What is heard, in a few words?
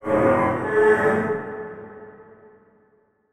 Transition Metallic Swell; Production Element; Title Transition; AdobeCC